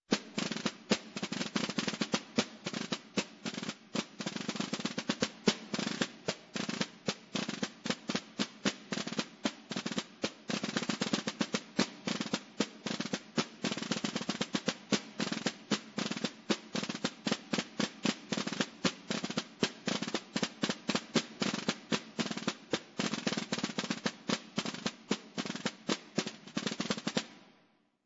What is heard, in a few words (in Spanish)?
imagenes, tambores; Urrea de Gaén